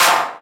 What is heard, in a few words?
Clap; Vengeance Samples; VEC1 Claps